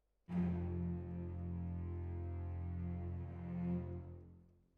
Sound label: Violin Solo